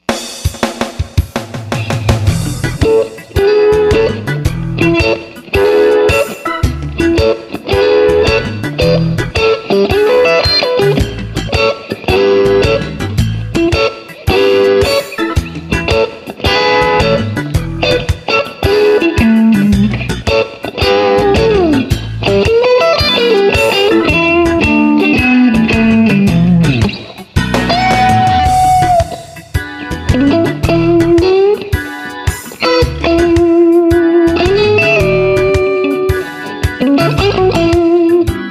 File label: strat; Trinity TC15 Amp; lightning